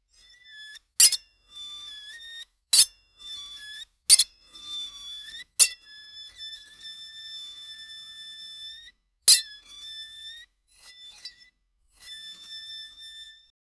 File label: metallic knife shing sou; metallic tap